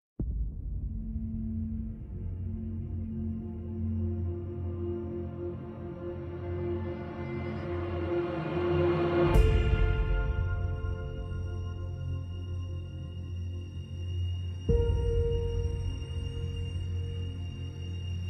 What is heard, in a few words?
Trailer music; Joker